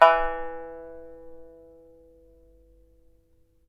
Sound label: SAN XUAN E4 MF; Spectrasonics; Heart of Asia